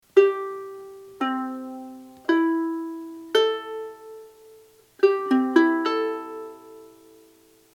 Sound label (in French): ukulele resouke GCEA cordes a vide